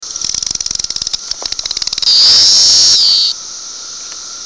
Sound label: Magicicada cassini female, Arthropoda